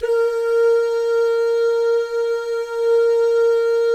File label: DOO MIX, Vocal Planet Jazz, GUY GAL; ILIO; Jazz & FX